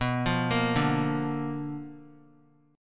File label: B Minor Major 7th Flat 5th Guitar; BmM7b5 arpeggiated; JGuitar